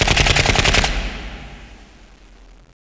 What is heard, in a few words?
mgun; de loststation cz